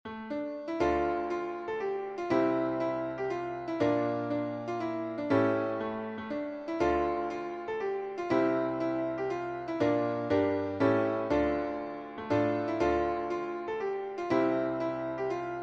polska

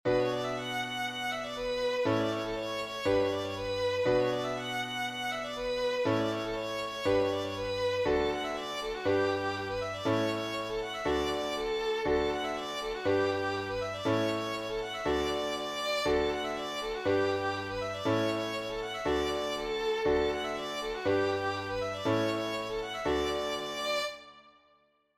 schottis

